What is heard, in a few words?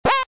Arcade 8 bit jump